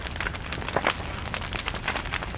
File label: Fire Loop; SFX Kit; Destruction